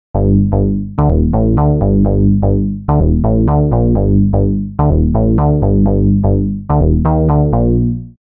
BASS Gritty

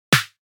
Snare; Unit Rcrds

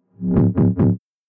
808 Slide

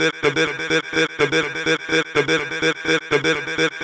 Vocal Chop 125BPM; Unit Rcrds

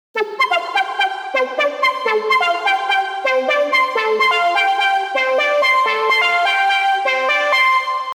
PLUCK Color

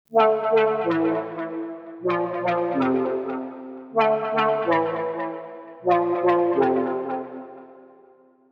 CHORD House

